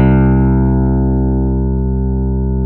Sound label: CP70; USB Soundscan vol.09; AKAI; Keyboards Old School